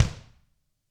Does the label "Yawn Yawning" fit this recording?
No